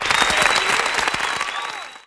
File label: sfx combo; Stunt GP